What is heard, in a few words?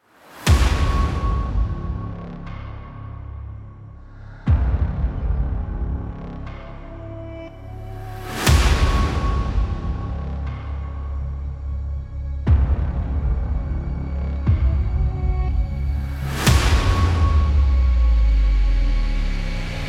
Heroic Powerful Epic; epic background music